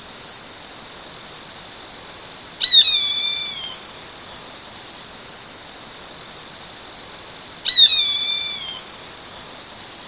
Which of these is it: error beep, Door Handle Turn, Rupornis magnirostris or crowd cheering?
Rupornis magnirostris